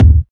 studio bass drum D# key, Royality free bass drum sample tuned to the D# note; kick drum